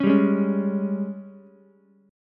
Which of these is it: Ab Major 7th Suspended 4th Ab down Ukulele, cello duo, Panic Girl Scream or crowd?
Ab Major 7th Suspended 4th Ab down Ukulele